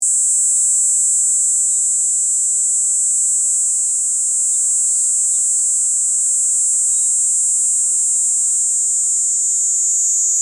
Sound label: cicada niinii